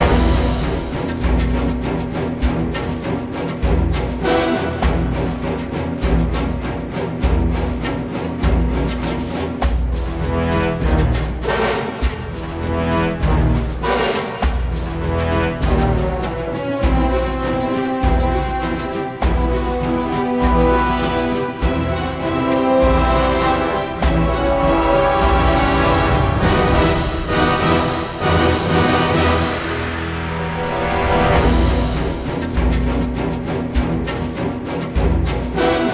Jungle; 007 GoldenEye; Wii

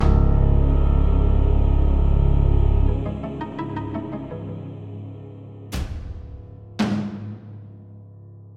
music dungeon formation

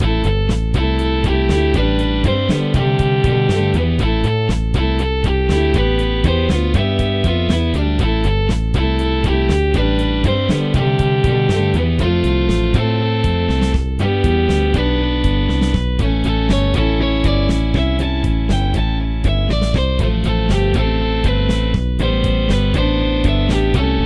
Rock; Yuru Yuru Gekijou Gekijouban 2 3

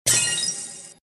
Sfx Item Speed Up

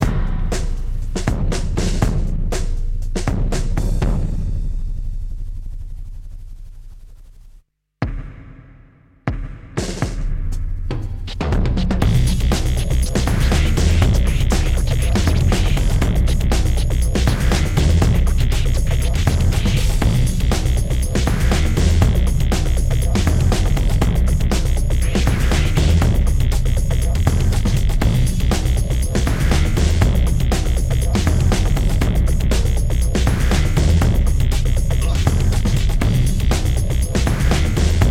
Rhythm; PixelJunk Shooter 2; ps3

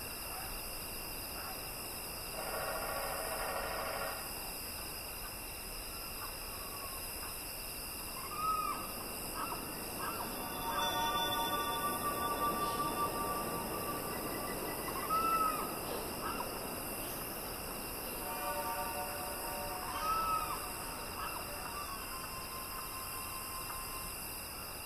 Circus Ambient

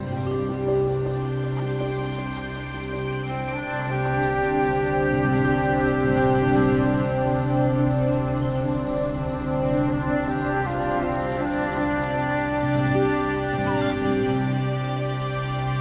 Exploration Village; Dungeon Hunter; android game music

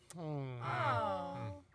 Small Jeers; The Princess and the Frog; wii windows; Hub Orleans; Music Voodoo

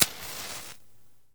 candle on

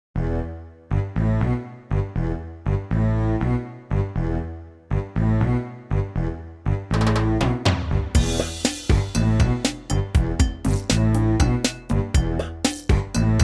Dungeon; The Dynastic Hero; PC Engine CD

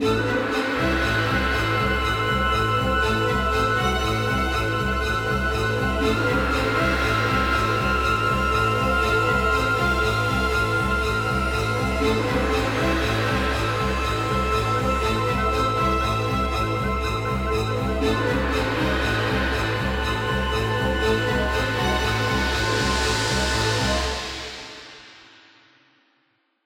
Alerted; tintin reporter cigars of the pharaoh

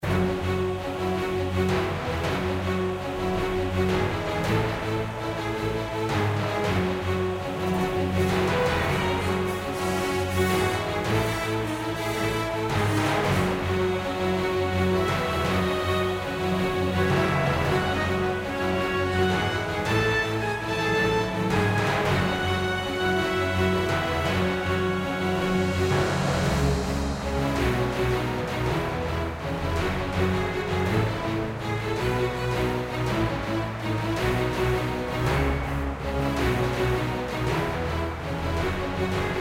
Epic Mountain Theme; Trouserheart; Android

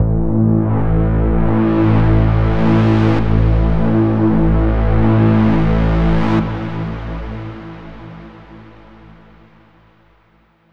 Overlay Drone; Act1 Rezoning

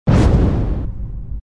Start

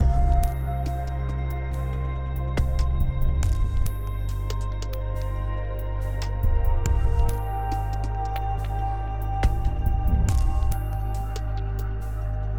Exploration, 70BPM

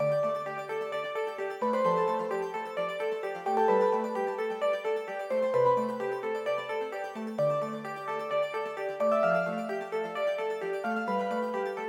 Music Victory 130bpm; Sockventure

